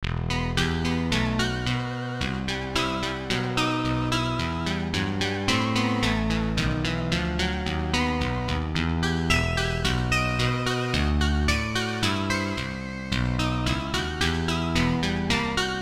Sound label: Clavi Plus; Vic Emerson; PolyM